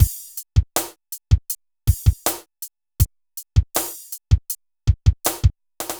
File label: Synth Loop, BPM 80